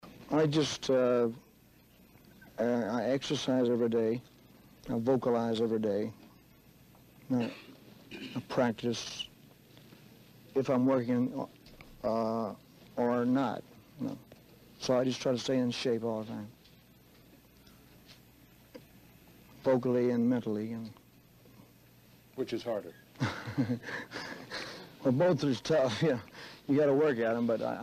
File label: vocalizing